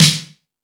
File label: Fusion Stix, E-MU Pro-Cussion; drum machine